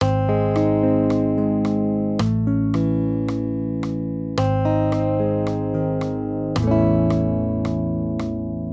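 Accompaniment, Major Triads, Ab-A, 110 BPM, guitar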